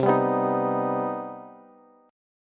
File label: C Minor Major 7th C Bass; strummed; JGuitar